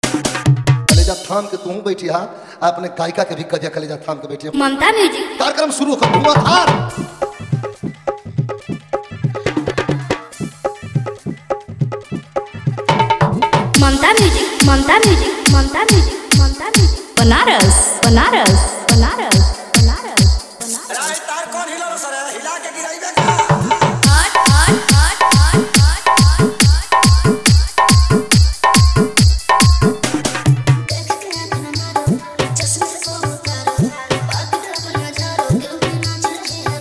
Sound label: New Bhojpuri Dj Remix Song